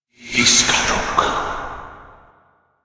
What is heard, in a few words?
Alt Fire Whispers; Warframe; Tenno; Grimoire